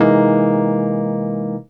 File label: CHORD, Drum & Bass, 150 BPM; Ueberschall; Drum & Bass Flash Trackz